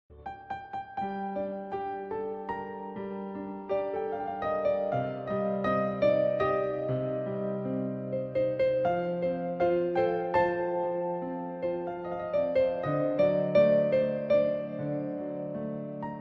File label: piano ringtone; english ringtones